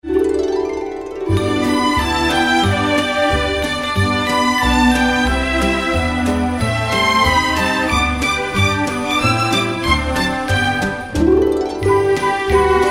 christmas songs jingle bells

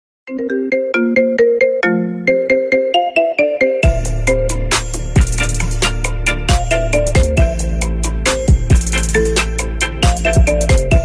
marimba remix, ringtones

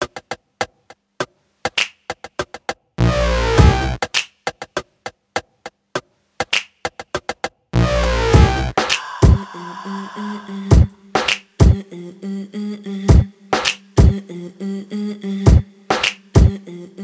drums

